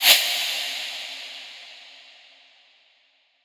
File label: Techno FX 135; AC2024 - Day 1 - Techno Sounds 2025